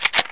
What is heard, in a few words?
m4 bolt pull, weapons